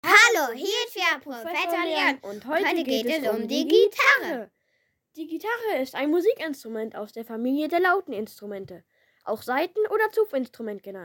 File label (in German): gitarre